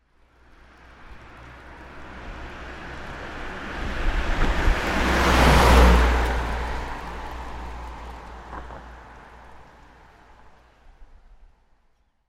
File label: Car: pass by from right on asphalt road, subtle leaves blowing when car passes; Car Sound Effects